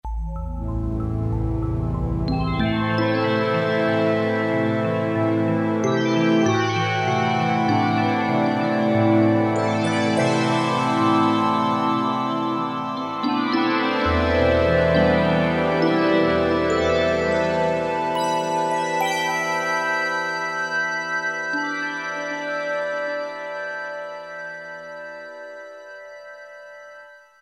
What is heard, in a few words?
Yamaha TG33; Factory Presets; Preset bank 1